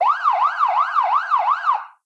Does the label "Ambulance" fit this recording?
Yes